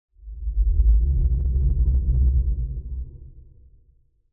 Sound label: sub low movement; sonniss gdc